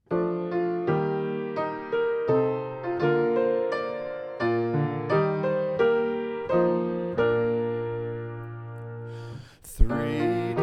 Three Days SATB B

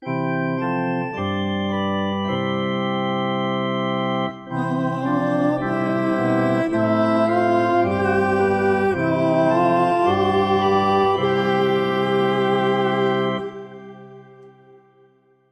Heritage Amen A

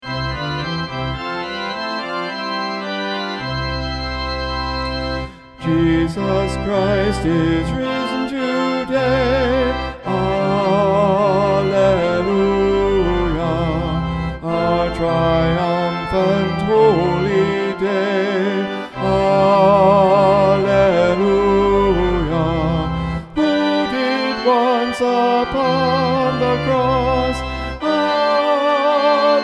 Jesus Christ Is Risen Today tenor

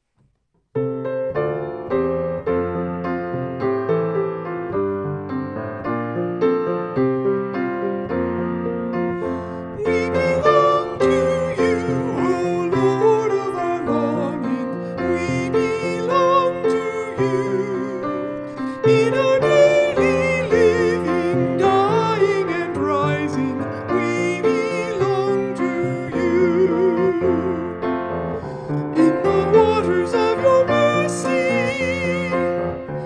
We Belong to You Melody, choir

